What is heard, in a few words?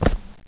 tile; valve